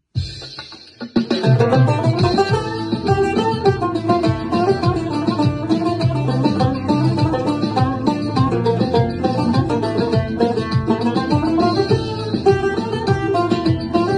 Cümbüş

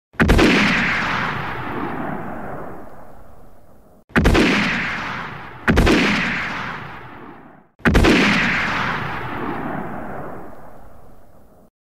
Spaghetti Western Winchester

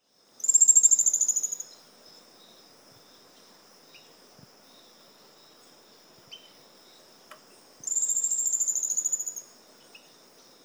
Piculet

